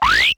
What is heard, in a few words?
creature; gonner linux macos ps4 switch windows xbox one gamerip 2016